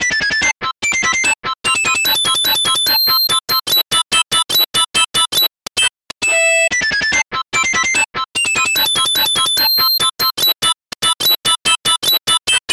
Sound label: guitar harmonics